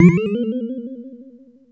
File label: Perc, Nord Modular Drum Samples; Yawgmoth